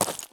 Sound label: run slate; Escape from Tarkov